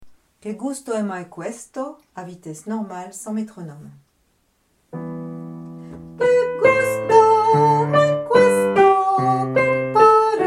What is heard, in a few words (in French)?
voix piano